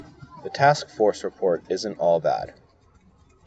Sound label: mixed bus; blstm cirm